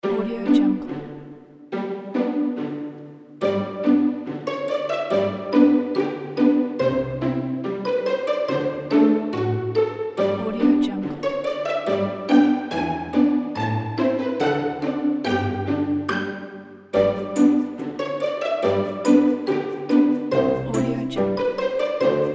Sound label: the pizzicato loop; plaincask